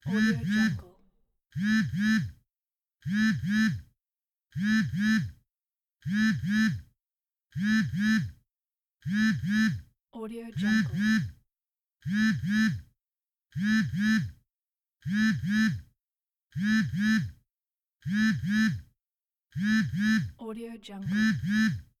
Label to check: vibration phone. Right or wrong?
Right